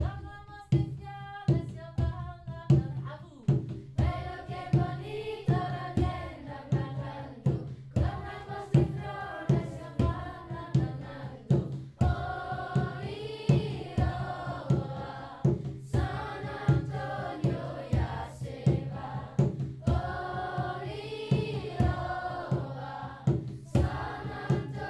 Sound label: Singing in Fontevraud